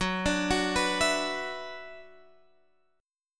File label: F sharp 7th Suspended 4th Sharp 5th, Guitar Open G; x,11,12,11,12,14